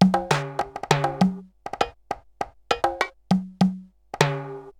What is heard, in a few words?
RX17 Perc (100BPM), cyborg beats; musicradar